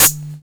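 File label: hi-hat, Hard & Loud Techno; USB Soundscan vol.01; AKAI; HH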